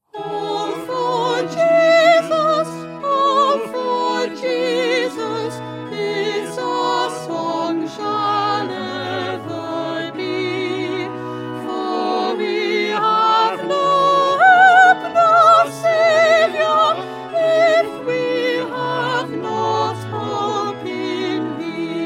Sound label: Soprano; Stainer; Crucifixion